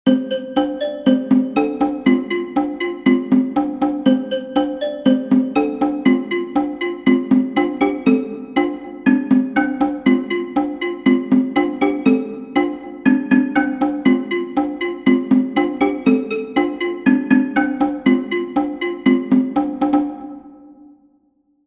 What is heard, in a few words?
Cajon; Hill and gully rider